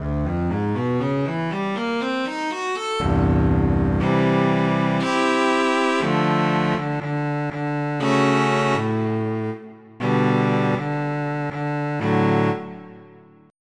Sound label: Cello